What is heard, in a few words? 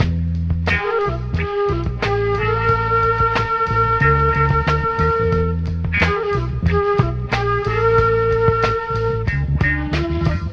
flute, Loops Phonky